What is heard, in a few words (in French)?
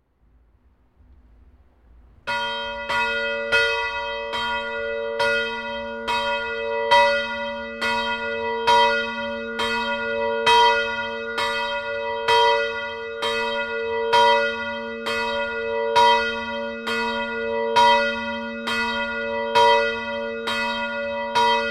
cloche; chenebougeries